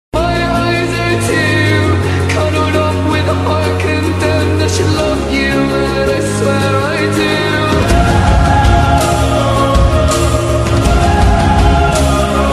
slowed and reverb ringtone

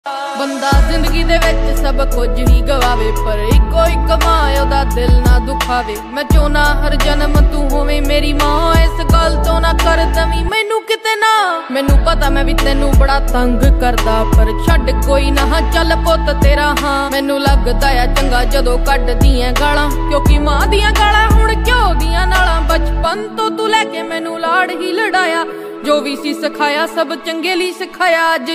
song ringtone

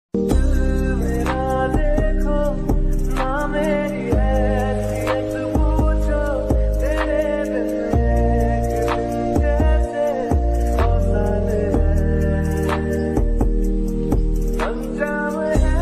song ringtone

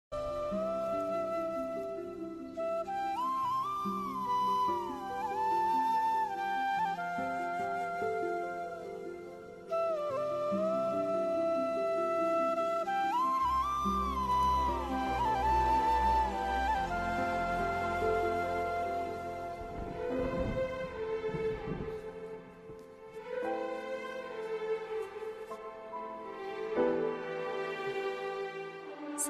instrumental ringtone love ringtone

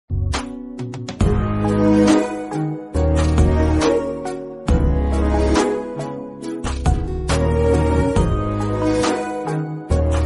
bgm love ringtone love bgm telugu; O Pittakatha

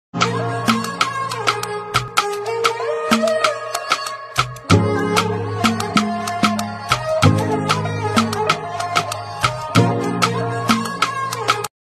funk

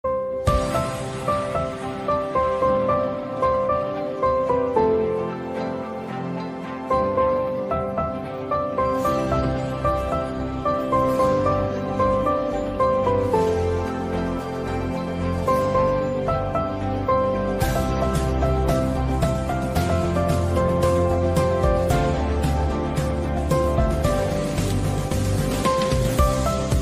ringtone instrumental

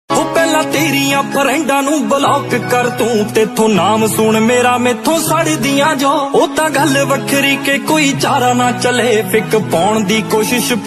hindi ringtone punjabi

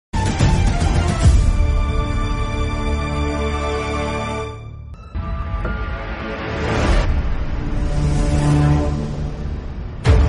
climax bgm; Drishyam; telugu

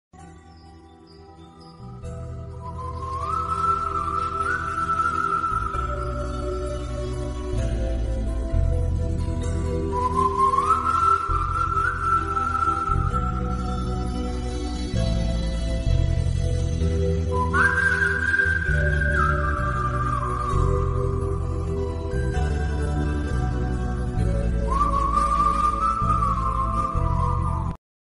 song music ringtone love song ringtone; surya; vaaranam aayiram

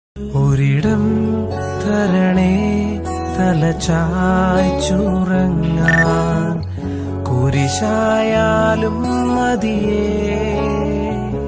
malayala christian devotional; malayala christian status

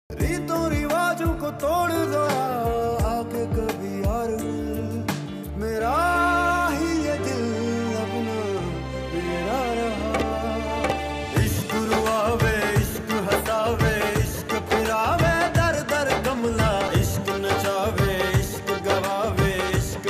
hindi ringtone, caller tune, romantic ringtone

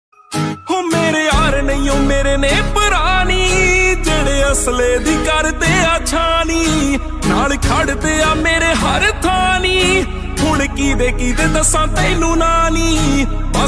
song ringtone new punjabi ringtone

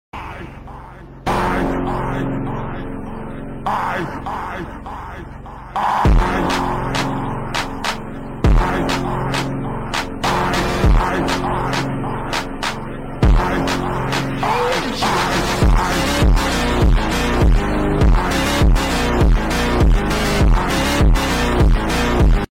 free fire pushpa 2 bgm ultimate action

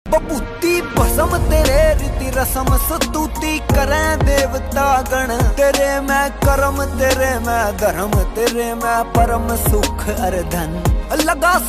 haryanvi song; black screen status; bhola song

